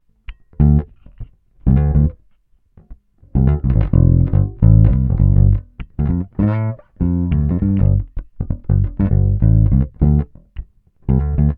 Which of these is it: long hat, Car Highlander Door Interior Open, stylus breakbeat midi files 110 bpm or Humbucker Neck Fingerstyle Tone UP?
Humbucker Neck Fingerstyle Tone UP